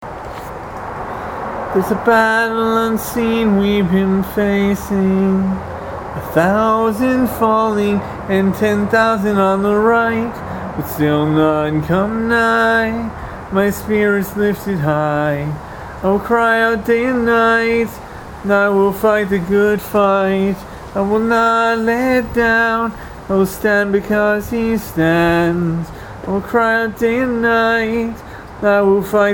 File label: cry out solo